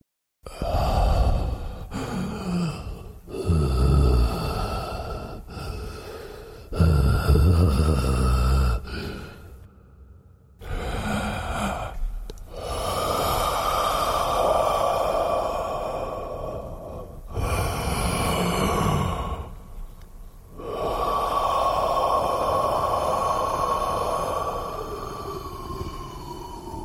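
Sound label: Heavy Breathe Ringtone, Horror; ringtones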